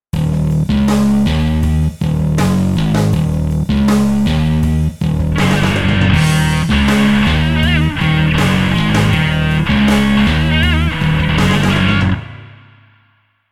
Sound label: dist bass